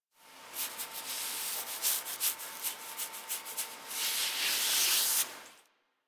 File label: Foley Interact Scratch Stand Forward; Enemies; Warframe; Catbrow